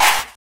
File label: Chant; fl studio; drumkits; dstarkels plugnb